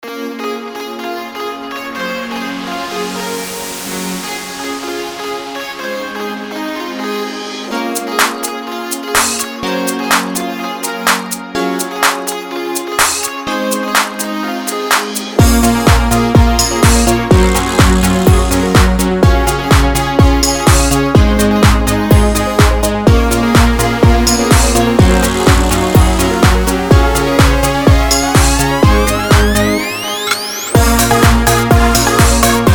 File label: Deep House